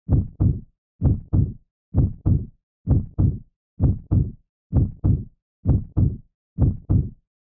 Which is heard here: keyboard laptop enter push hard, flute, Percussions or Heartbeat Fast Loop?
Heartbeat Fast Loop